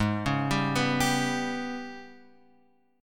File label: Ab Minor Major 7th Double Flat 5th, Guitar, Open F, AbmM7bb5 chord; arpeggiated